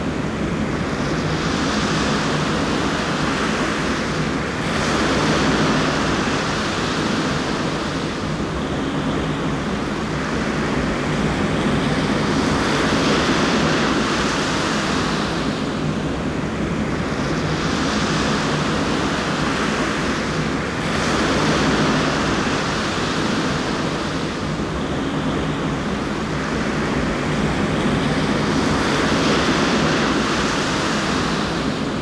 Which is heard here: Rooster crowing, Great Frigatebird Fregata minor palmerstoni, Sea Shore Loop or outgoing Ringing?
Sea Shore Loop